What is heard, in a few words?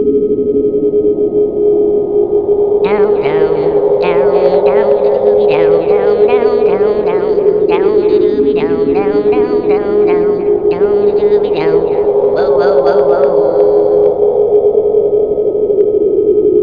wind; quake2; chaos